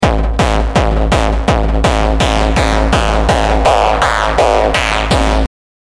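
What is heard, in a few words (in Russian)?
хардстайл для соседей; hardstayl dlya sosedey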